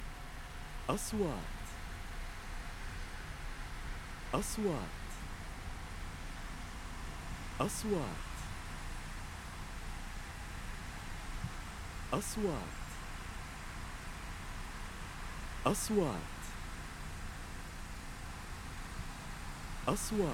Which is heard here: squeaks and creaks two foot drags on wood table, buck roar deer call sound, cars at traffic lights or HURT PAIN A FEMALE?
cars at traffic lights